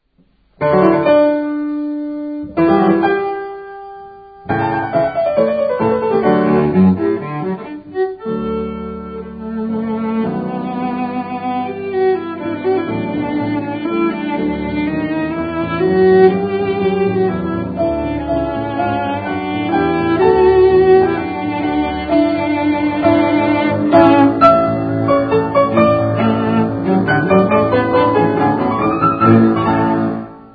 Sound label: cello; start of the 5th sonata